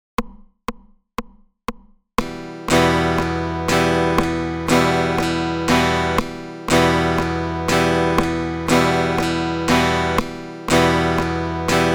Accentuation 120

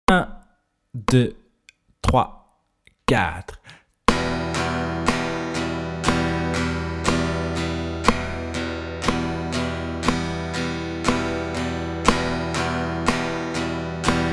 Flèches vers le bas rapide 60

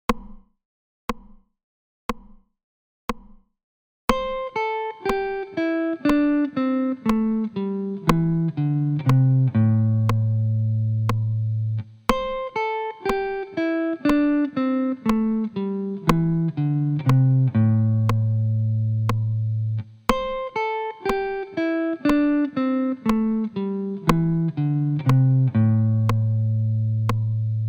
Vers le grave; objectif solo 15 jours pour apprendre a improviser

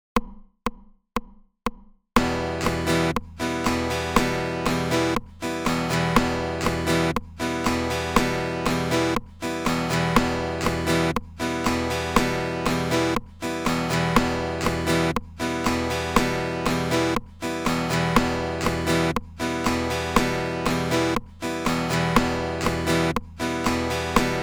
couper le son rythmique classique 120

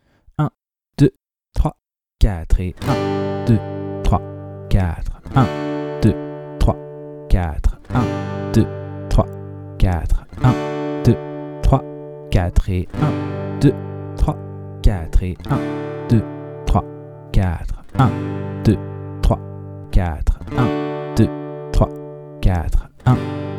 rapide passer de G à D; De 0 à vos premières suites d'accords

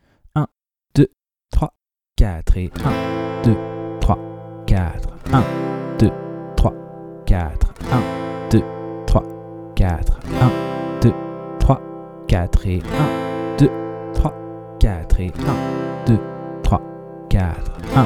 rapide Enchainement de Am à C

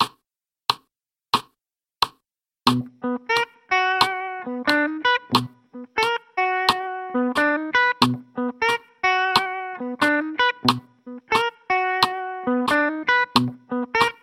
Si 90 bpm

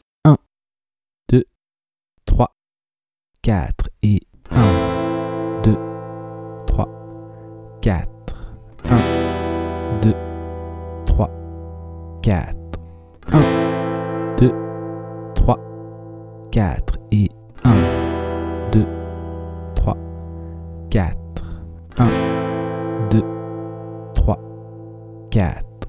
lent Enchainement de Am à Em

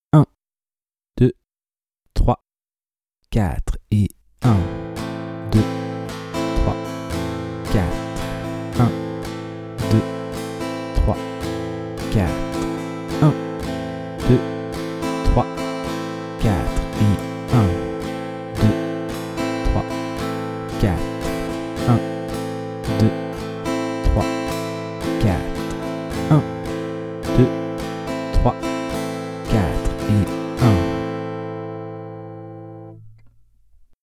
rapide Travailler la rythmique rapide; De 0 à vos premières suites d'accords

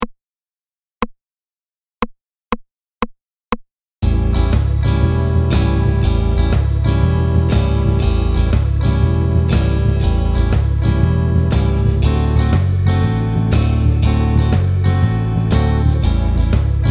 rythmique 2 120

